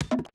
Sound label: foley; bespoke - Floral v.2